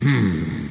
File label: humm; BattleSNDS